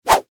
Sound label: Foley Whip Whoosh Swoosh; Whoosh Sound Effects; Whooshes (Sound Effects:Sound Design); SFX Bible 6